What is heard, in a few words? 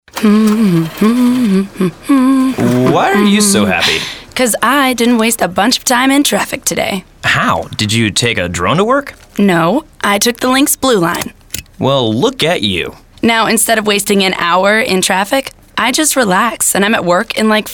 Music; CATS; CATS On Time Campaign